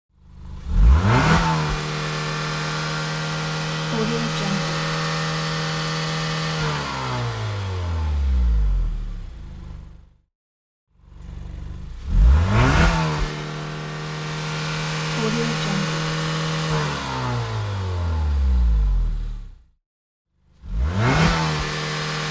car revving

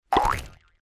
funny jumper; tibasfx

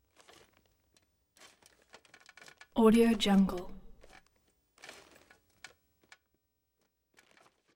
armor; prosoundfx

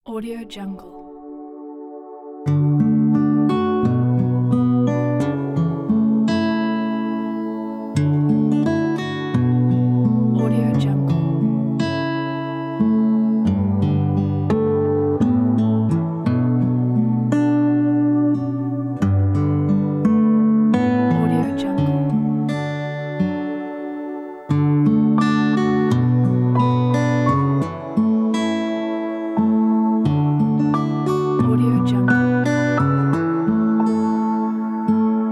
melodic peaceful smooth guitar

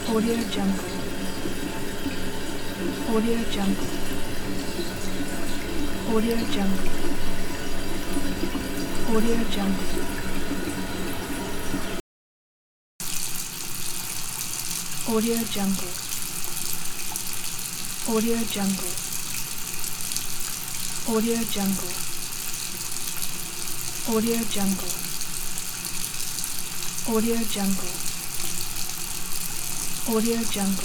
running water loops sound pack

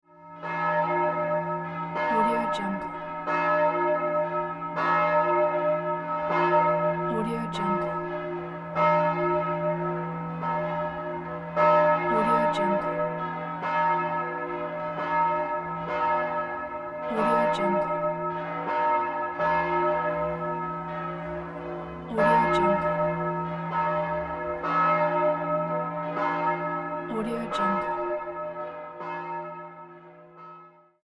church bells

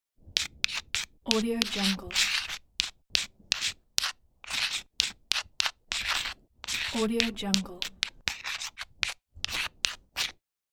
chalk writing on concrete; sounddivision

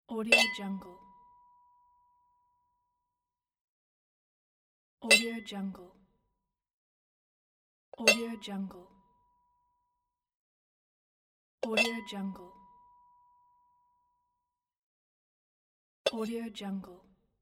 butter dish open

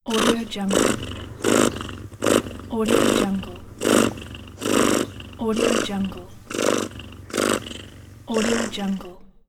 cat purrs

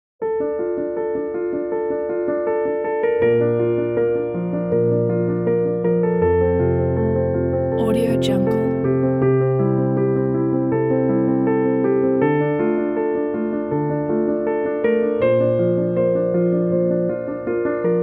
piano solo

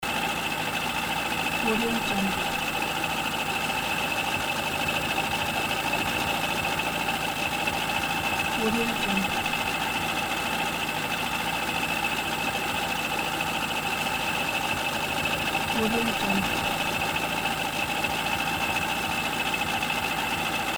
boat motor trolling loop

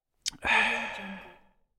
satisfied human ahh

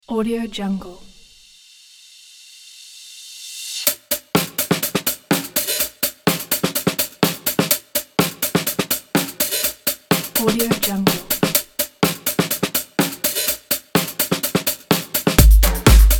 percussion kit

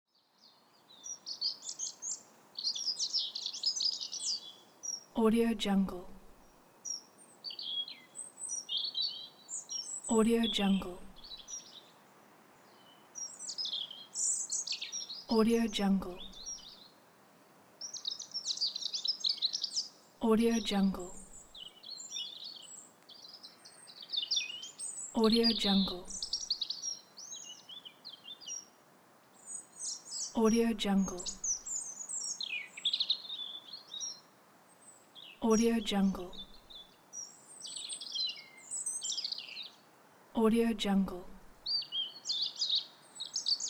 garden ambience

